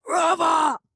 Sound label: Flying Sentry Startle; Ruins